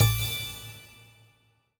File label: Win attention; netent